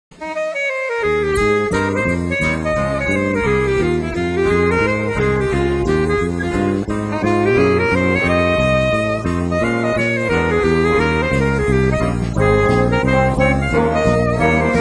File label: Sax